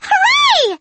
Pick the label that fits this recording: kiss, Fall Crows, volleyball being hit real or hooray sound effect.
hooray sound effect